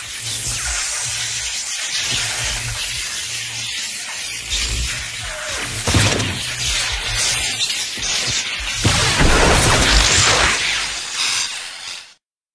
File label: lightning sound long